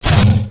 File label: Glyptodont Struck